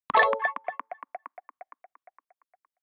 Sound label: UI NOTIFICATION Signal